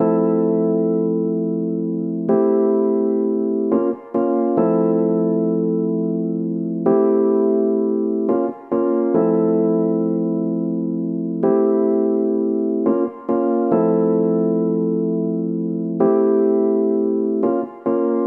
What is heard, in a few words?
Dm new jazz rhodes loop; Electric Piano; Electric Piano Rhoads; Various Keys